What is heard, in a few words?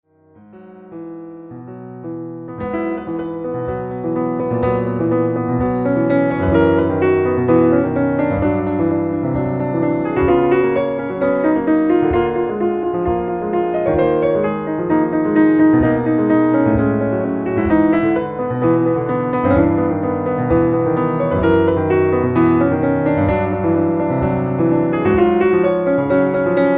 improludes